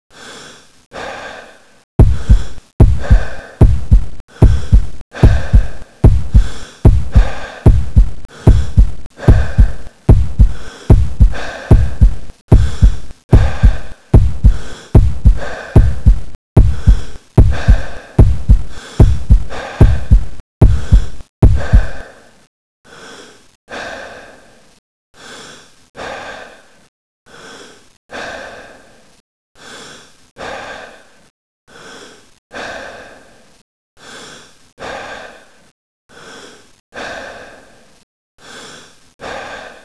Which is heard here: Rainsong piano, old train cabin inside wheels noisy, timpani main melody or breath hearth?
breath hearth